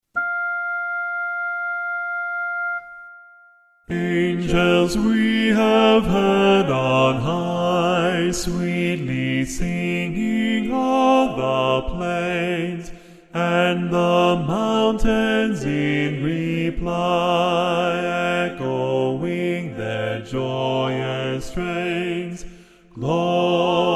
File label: angels bass